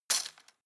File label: cutlery plastic drawer place hard